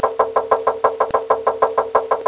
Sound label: Female Release Call